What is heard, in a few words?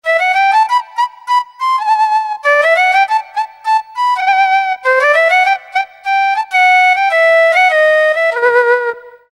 Flute Ethnic Solo; mfx chain